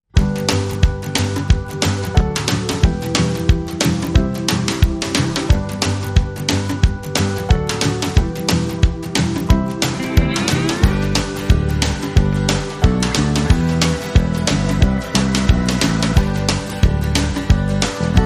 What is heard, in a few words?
No Whistle